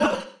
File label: hurt; FlyForInsanity